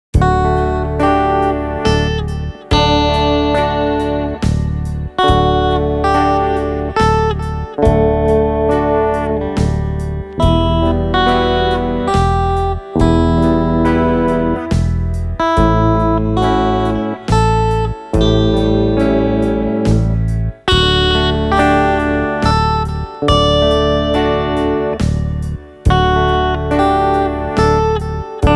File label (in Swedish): Fingerplock med melodi